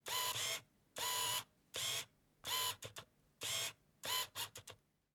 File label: camera focusing